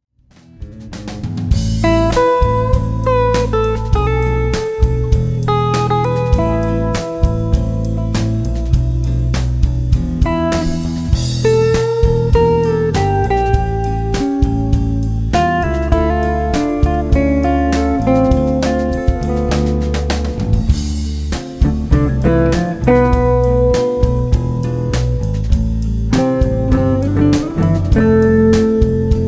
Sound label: Dinner Jazz